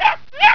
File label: DOG YAP; ANIMALS